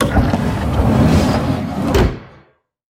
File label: Aircrafts Mi-8MTV2 Door Open; DCS